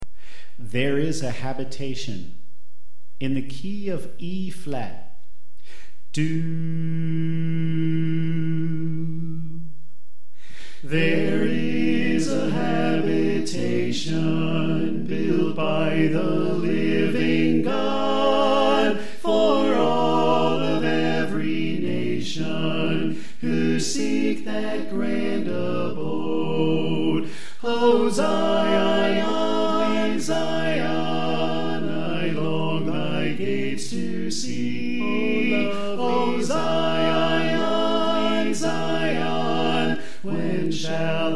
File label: Bass Left